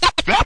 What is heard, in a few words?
scratch this way that; Scratching and Turntablism; DRUM LOOPS and BREAKS